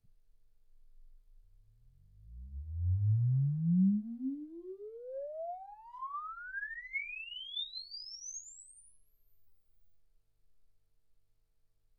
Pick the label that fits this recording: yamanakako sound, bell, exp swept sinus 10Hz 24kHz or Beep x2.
exp swept sinus 10Hz 24kHz